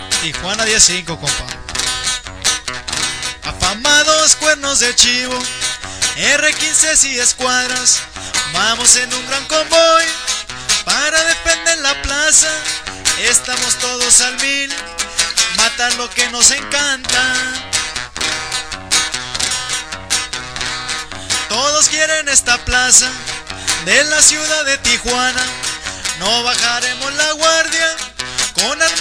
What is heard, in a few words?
brass; tijuana